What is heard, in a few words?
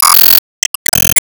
Box Extend